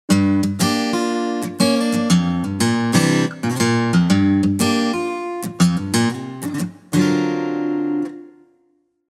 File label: Guitar Bright Vibes